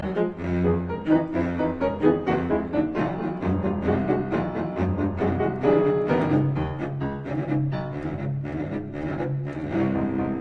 Cello Sonata quarter note = 80; Turok; Op 50

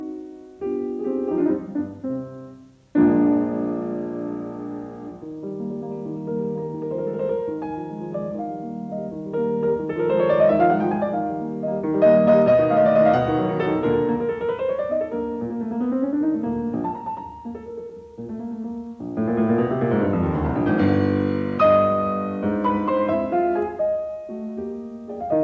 Piano Sonata in C minor Allegro assai; Lili Kraus

